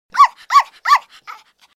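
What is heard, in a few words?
dog; son isole; chien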